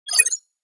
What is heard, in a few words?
Futuristic transition